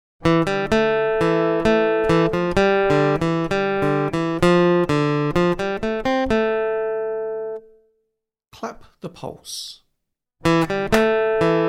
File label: Aural Rhythm Test, acoustic guitar; Grade 7